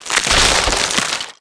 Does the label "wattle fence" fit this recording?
Yes